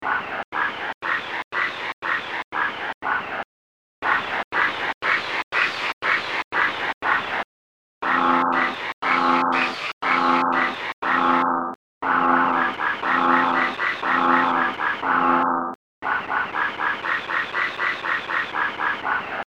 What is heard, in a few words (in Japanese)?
kaeru Canon